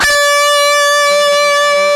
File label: GUITAR, POWER GT